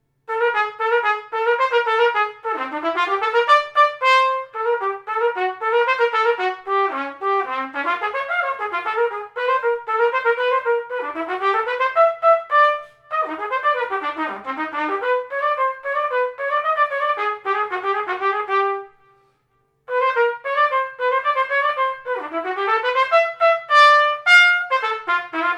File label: burlesque, trumpet; Vizzutti; Etude No 12 – Burlesque